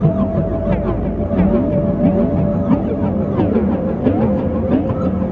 Ambi Groove; Sonic Foundry (Sony Creative Software); Ambient Grooves; Ambi-Grooves

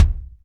DM5 Kick, Alesis DM5 drum machine

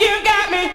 VOX SUNG; AMG Megabass REMIX! VOL-2

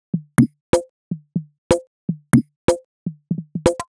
Top C# 123bpm, House Drumloops; Zero-G House Fabrik Drumloops

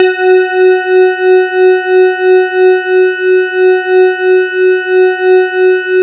E HARP R, DX HARP

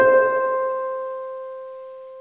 HARP C4

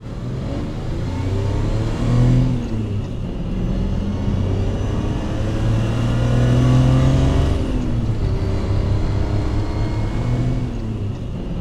DRIVING, Back Ground; AKAI S6000 CD-ROM - Volume 6

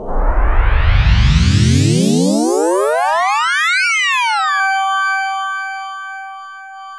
rise; Optical Media International; Sonic Images Library; SI FX Vol 10

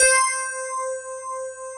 FM ELP 7 C4 R, Keyboards Old School; USB Soundscan vol.09; AKAI

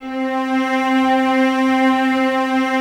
cellos C 4, String Ensembles; Miroslav Vitous; 90 s Sample CDs

